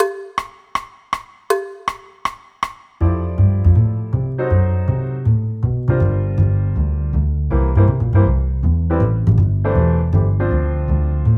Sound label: drumset